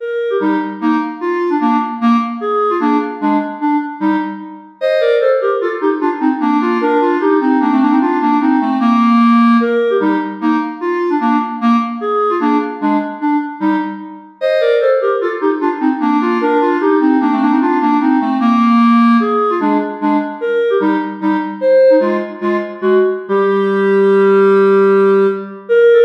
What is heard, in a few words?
clarinet duet